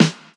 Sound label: rich lows steel snare drum sample e key